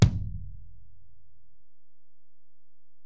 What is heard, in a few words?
Ac BD, Alesis DM-PRO; 300 Drum Machines